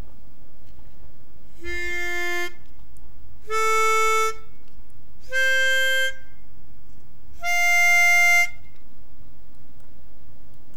arp pipe

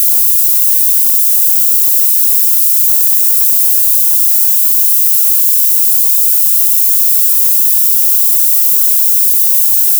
noise 440

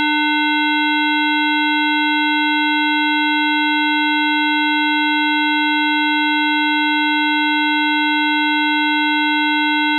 round hp 2

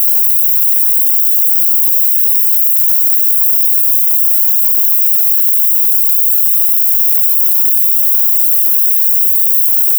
noise 440

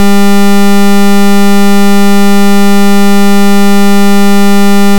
round sin 100 1

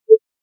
helix spulse 440

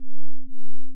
sin p2

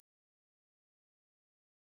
alias half skew

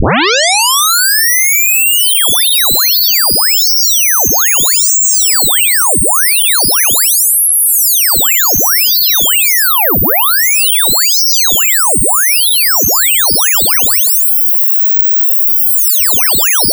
hp sweep

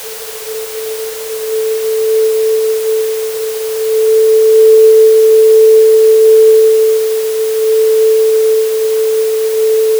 noise 440

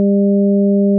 3 sines random

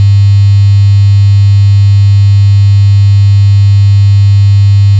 round sin 100 1

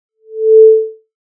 helix lpulse 440

